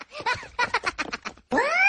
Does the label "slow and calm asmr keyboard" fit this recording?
No